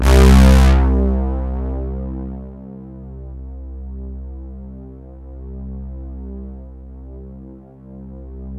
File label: JV POLYBRASS, JV-1080; Syntec; Wall of Sounds